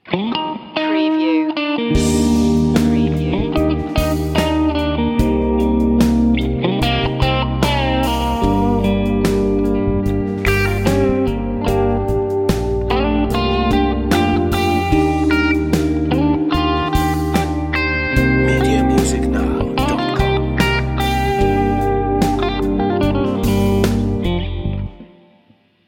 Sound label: strings and melodies loop